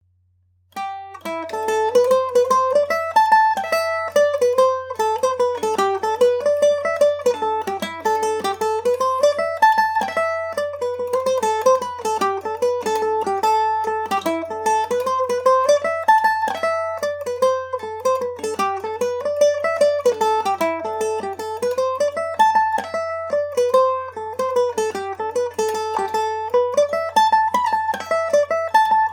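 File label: A dorian md304, Irish mandolin; humours of tullycrine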